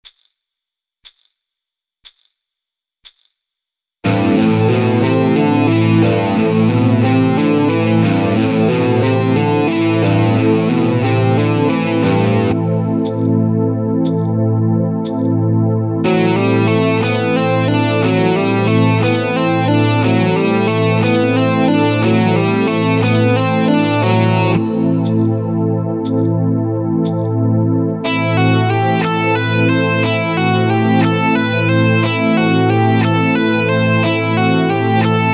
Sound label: Metal Bootcamp